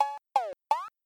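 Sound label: Cow Bell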